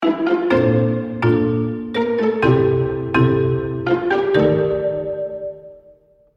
speaker

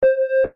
beep

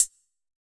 hihat, electronic

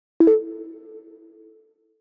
beep, pomodoro

